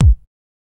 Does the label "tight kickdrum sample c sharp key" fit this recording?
Yes